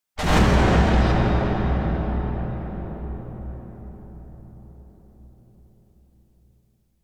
Impact Metal Drop Distant Shock Hit Strong Metal Strike - Reverberant; Hollywood Edge; sound designer's toolkit v2